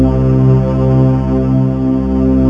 PAD Oct Strng; Best Service Gigapack 1

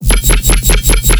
BASS SHOT Repeater

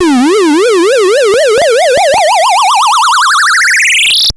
Siren; Graz

